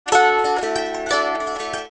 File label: Valiha